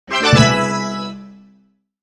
Power up retro achievement mobile game; level up

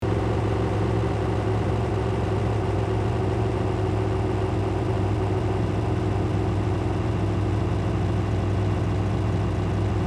Industrial semi truck engine idle loopable

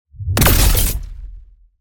Powerful energy blast shockwave

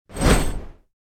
Pickaxe launch no hit

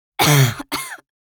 Intense coughs teenage girl; cough sound effects; gfxsounds

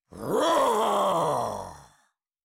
Cartoon lion voice roar

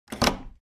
Door closing

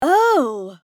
Sexy female surprise reaction

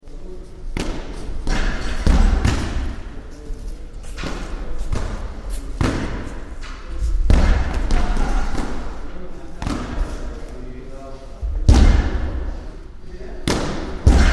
Boxing gym ambience boxers and kick boxers

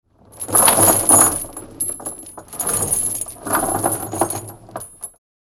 Chains dragging on wood

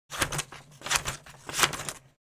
Blunt scissors cut thick paper; Paper Sound Effects

